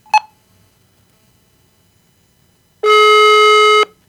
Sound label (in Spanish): Tono Llamada